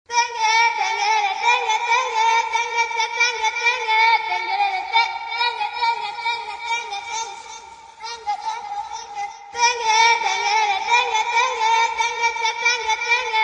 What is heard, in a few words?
Acapella